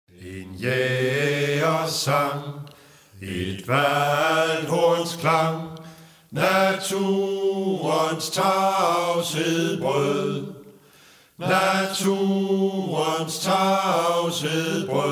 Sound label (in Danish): Et Valdhorns Klang